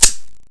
fiveseven slide pull; czero opforce

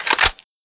sg550 bolt pull; czero opforce

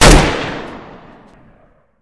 ump45, weapons; czero opforce

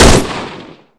p345 fire, weapons; csof

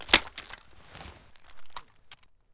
m95 clip in; czero opforce

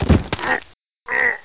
bhit kevlar, player

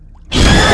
aaa, Scream; goldsrc; cstrike

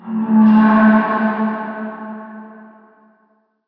metal sfx; cstrike; metall sfx; mansion

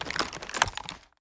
saw draw; csof

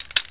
SPAS12 bullet in

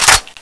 aug clip in; czero opforce

